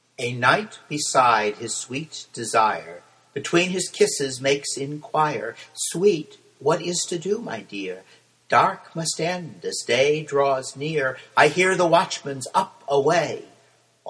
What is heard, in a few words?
Dawn Song; Medieval; Bardic Circle; poems